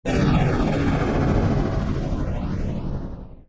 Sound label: kaboom; no longer alone sfx